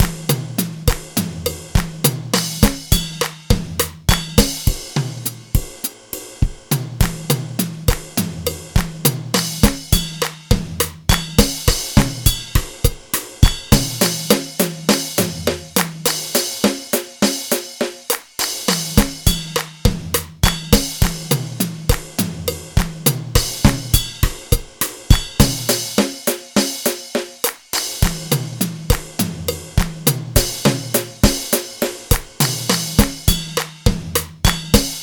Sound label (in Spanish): Ritmo Hydrogen